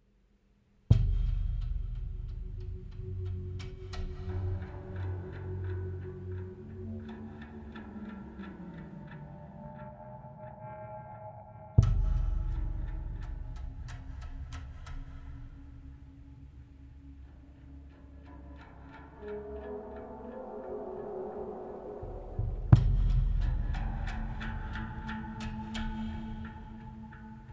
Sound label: intro ambience; inFamous; PS3